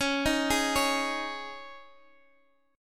Ab Suspended 4th Db

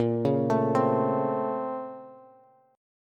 A sharp Minor Major 9th, down, Bass

